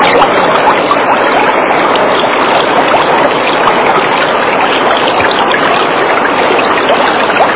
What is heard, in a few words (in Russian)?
techenie burnii shumnii priglushennii